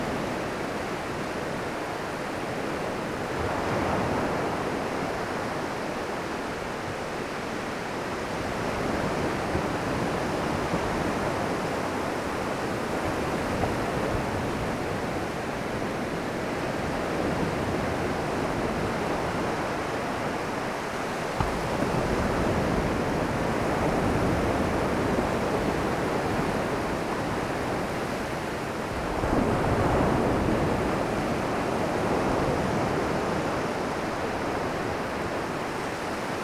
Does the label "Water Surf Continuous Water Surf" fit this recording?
Yes